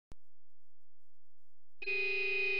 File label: claxon